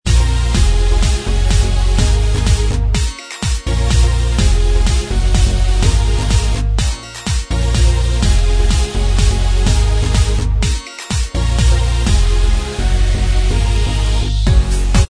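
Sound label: Inst Track, instrumental track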